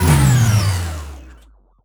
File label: silent mode stop; Shattered Horizon